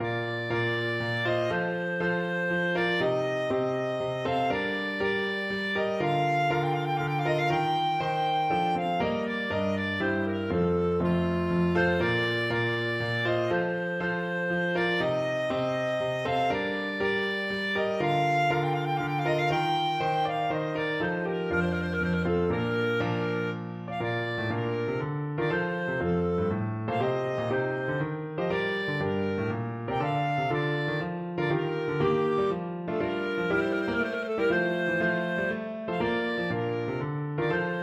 chaconne fairy queen clarinet

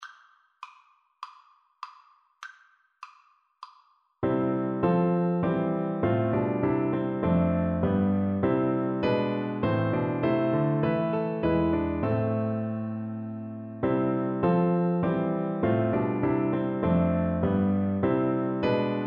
o sacred head, Viola

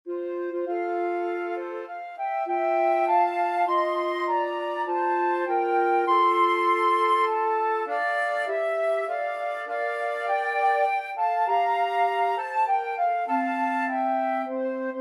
mendelssohn nocturne, 2 flute clarinet

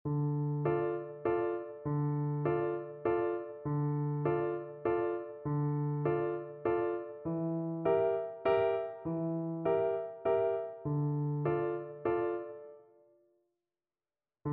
creak crunch scream, Bassoon

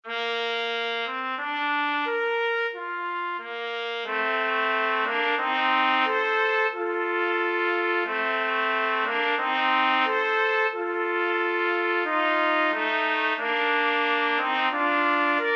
pongauer jodler trumpet duet

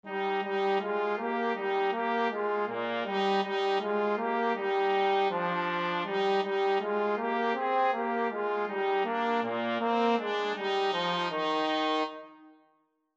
trumpet trombone duet

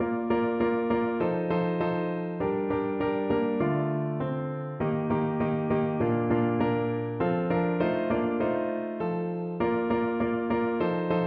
old st nic, piano

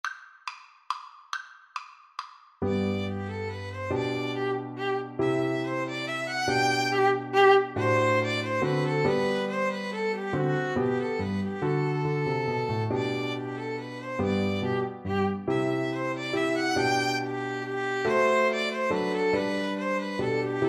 Piano Trio

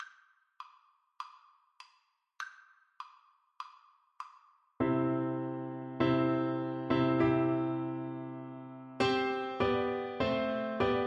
March D, Flute

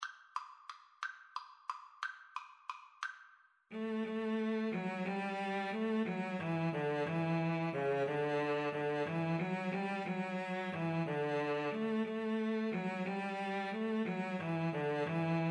cello duet

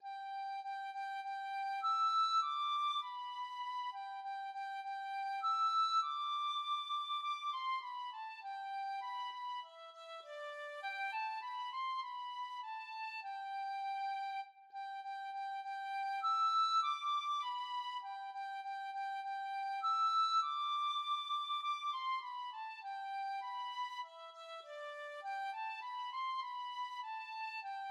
the holly and the ivy, tin whistle

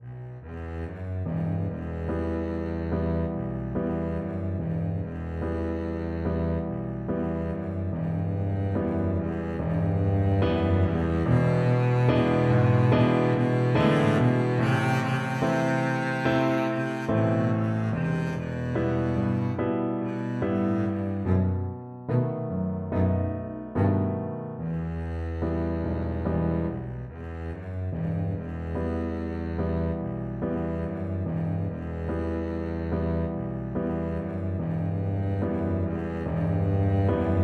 bella ciao, Double Bass

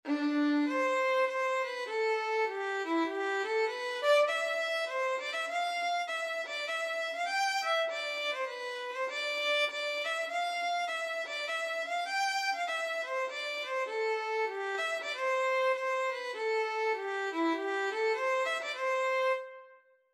violin; angels whisper

